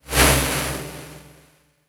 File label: Aggressive Transition